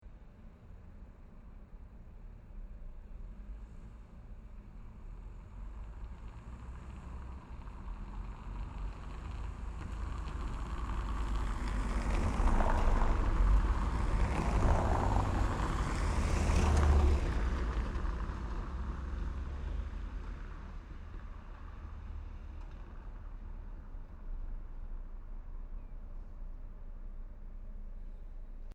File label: Europe France Paris City Mutiple Car Passbys Slow Cobble Stone Road; Tom Hutchings